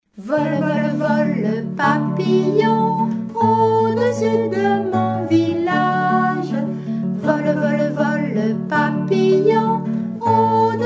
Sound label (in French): Vole vole vole papillon